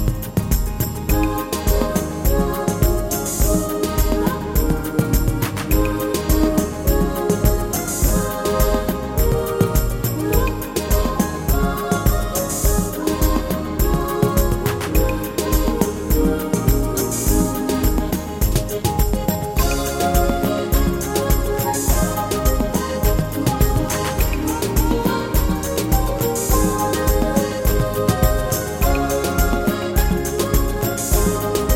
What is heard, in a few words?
Rumba Napoletana